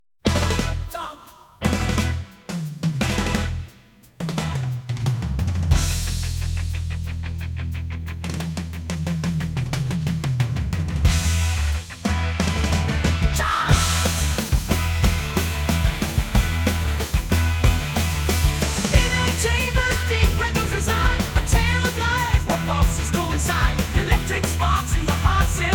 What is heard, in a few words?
Heartbeat